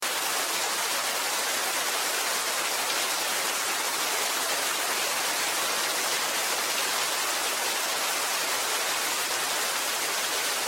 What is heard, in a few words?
rain downpour